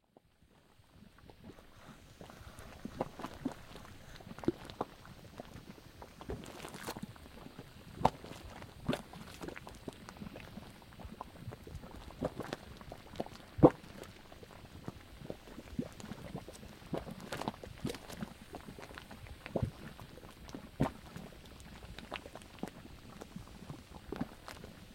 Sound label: Yell; Frogsong